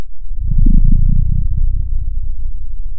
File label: pop, Sound of a dinosaur